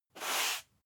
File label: broom sweep slow single